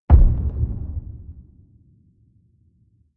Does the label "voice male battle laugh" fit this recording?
No